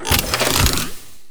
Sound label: reload, weapons; tf2